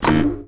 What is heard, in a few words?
Magic Spell Invalid; DunDef